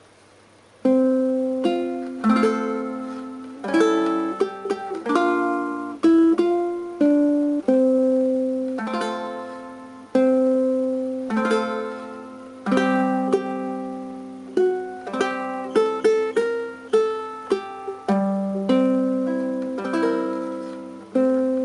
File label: Ukelele Solo; Aloha Oe